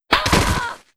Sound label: slap; HIV slap